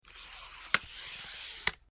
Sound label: Tri-Pod Leg Extension Foley; Scrape; Scrapes (Sound Effects:Objects)